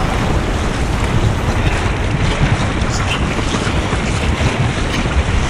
lava loop; Quake 4 Alpha; environment lava

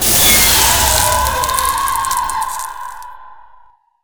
freeze; Quake 4 Alpha; strogg; iron maiden

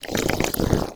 imp chatter, monsters; DoomRTX; imp5

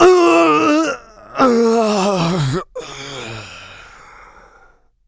death, player; Doom

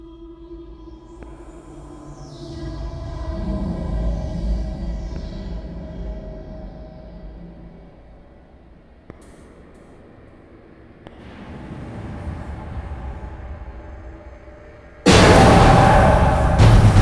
cryo cue, music; Quake 4 Alpha; id software

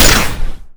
turret fire, weapons; Quake 4 Alpha; weapons secturret

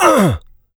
pain light, player; DoomRTX; small

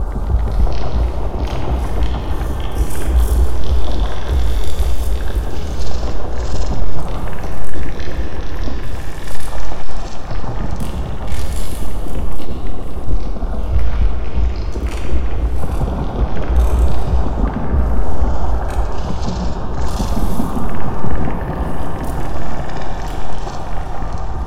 ice loop; Quake 4 Alpha; id software

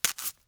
Imp Tear Flesh, Claws flesh; Foley

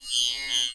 keypad positive; gui; DoomRTX

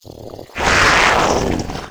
imp sight, monsters; DoomRTX; imp5

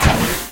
turret switch; Quake 4 Alpha; id software

